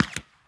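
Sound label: ricochet; tusken; Star Wars Obi Wan; XBOX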